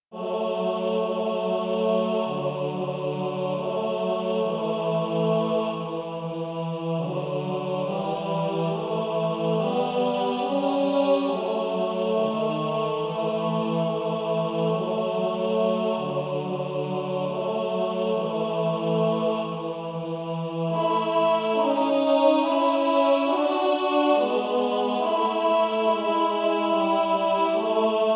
Male Choir

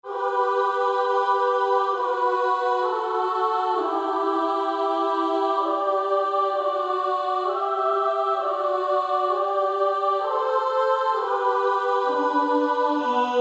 Female Choir SA; SA 2-part

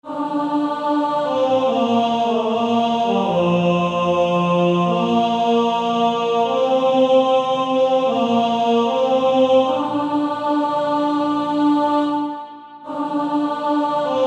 Male Choir; Pass me Not O gentle Savior

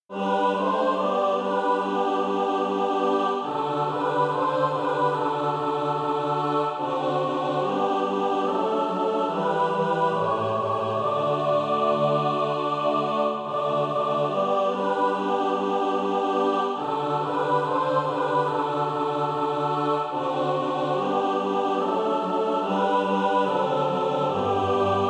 mixed; Christ Is Born; Highfield

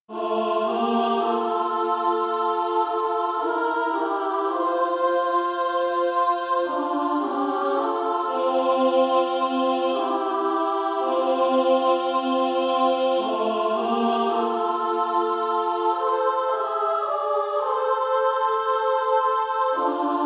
Female Choir SA

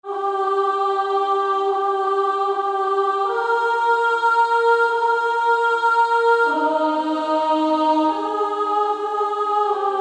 Female Choir

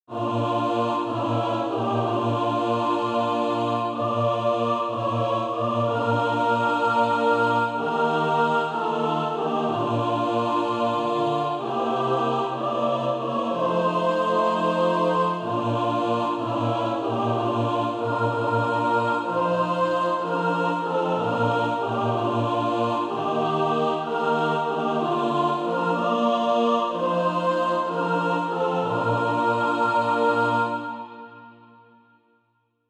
mixed

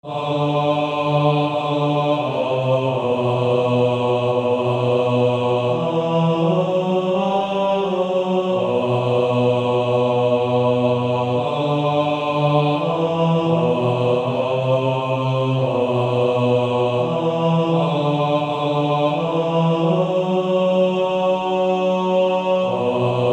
Male Choir; Baritone

